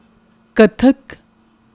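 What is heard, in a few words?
Kathak, Indian classical dances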